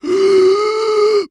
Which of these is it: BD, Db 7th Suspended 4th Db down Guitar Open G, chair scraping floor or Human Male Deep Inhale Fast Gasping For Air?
Human Male Deep Inhale Fast Gasping For Air